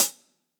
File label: Acousti Hat Hit, hihat